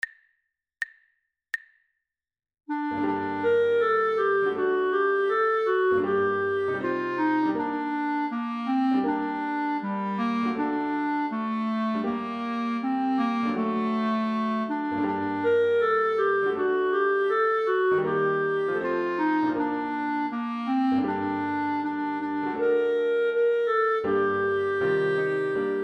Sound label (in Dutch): The Blessing Nigun met klarinet